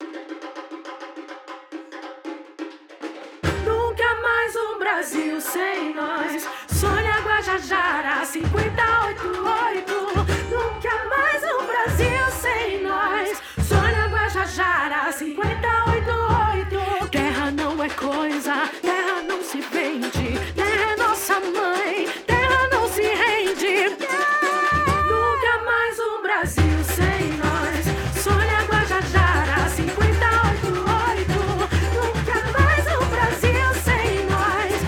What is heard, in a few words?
Jingle Samba Mix